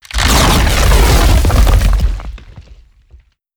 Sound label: tower destruction; building; damage; radiant